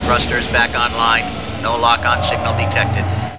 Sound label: thruster